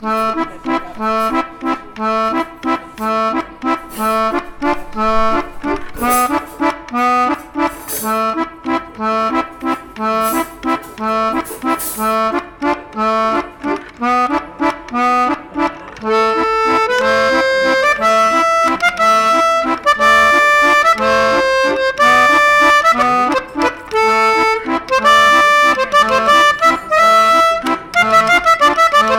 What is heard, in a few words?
Swedish Hohner Waltz; Mission District